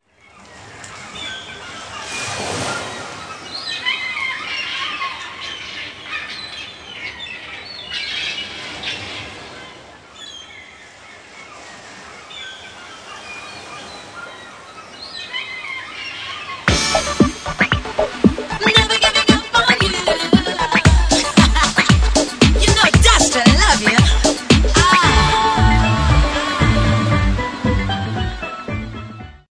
steel dub

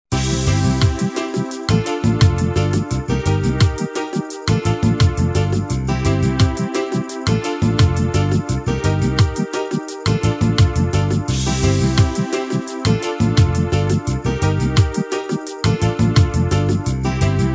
steel and voices

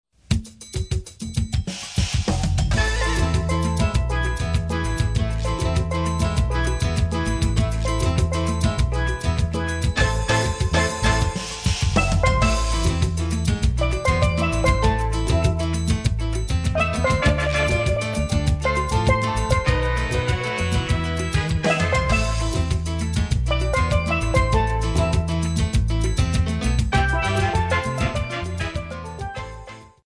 pan meets guitar

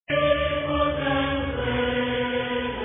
hallelujah chorus

